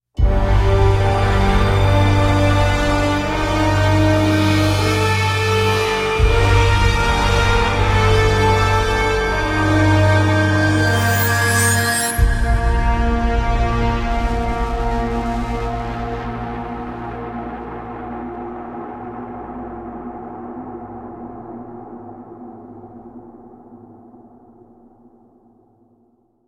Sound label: Epic Cave; Marlow Briggs and the Mask of Death